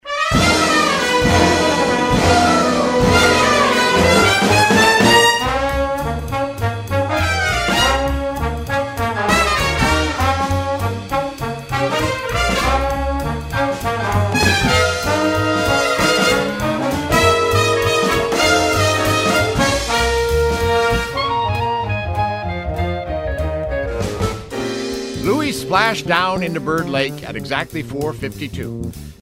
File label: philadelphia swing